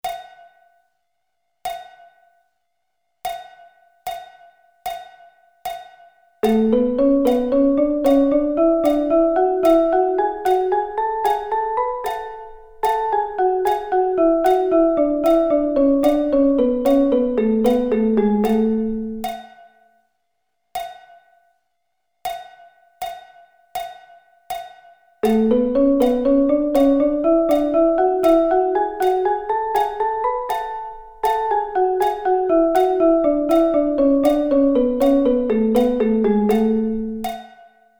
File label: A Double Sticking